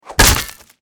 mace flesh

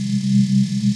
flying, weapons; serioussam2

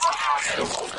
infector idle; flood

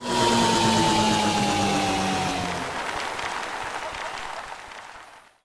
throttle off, vehicles; tdmcars; gtav

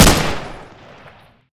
bar shoot, weapons

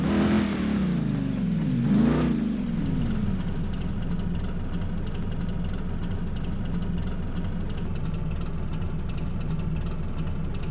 throttle off fast, vehicles, belair; sgmcars

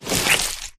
human form short move; flood

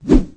leap melee, flood human

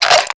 shells ammo; serioussam2

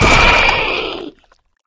combat body destroyed; flood

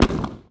explosion small

